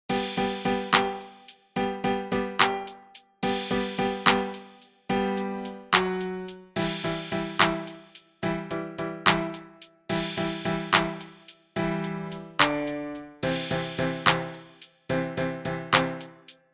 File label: BMP72 G C Em D